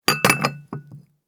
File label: Put down the coffee cup